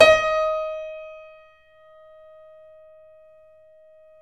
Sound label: KEY, YC7; Roland L-CD701